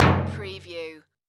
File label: Metal Drum Hit Dull